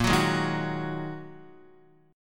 Bb 7th Suspended 4th Sharp 5th Bb Guitar 4E,4A,5D,5G,5C,6F; Bb7sus4#5; strummed; x,1,4,1,3,1; JGuitar